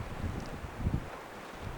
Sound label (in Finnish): tuollainen aannahdys raystaspaaskylta ilmeinen koiras